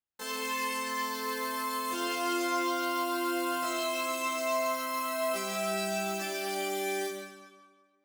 pad

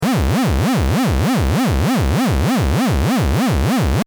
lfo

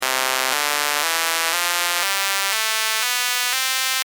highpass

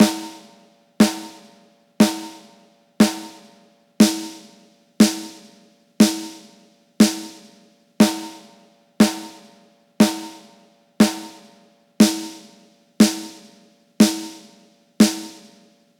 snare

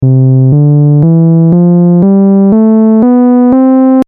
filter h

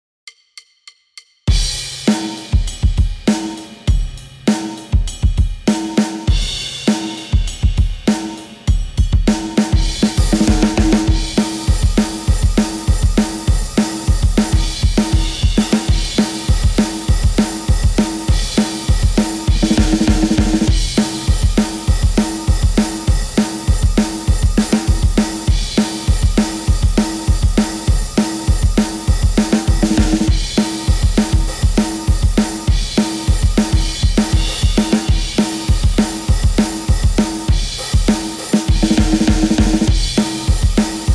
Drum

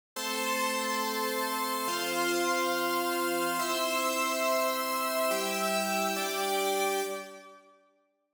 pad

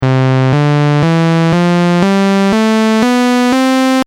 reso off

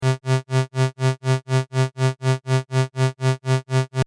lfo amp

